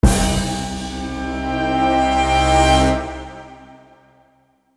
Surprise; Mafie 1 cz

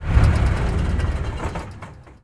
truck; Mafie 1

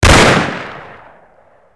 S&W; Mafie 1

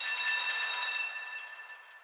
tram; Mafie 1

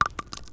sand; Mafie 1

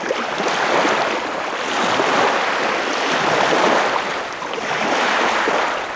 big wav; Mafie 1

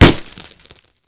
glass; Mafie 1

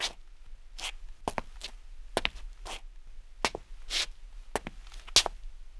preslap; Mafie 1

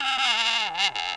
creak; Mafie 1

